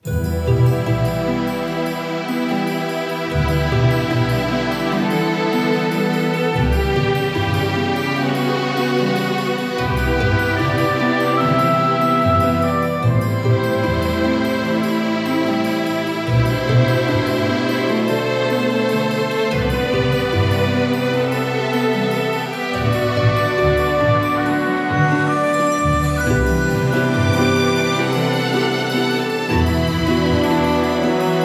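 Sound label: GameMenu BPM74 Em Violin; 4/4; Strings + Woodwinds; Game Menu 6 – Violin.ver –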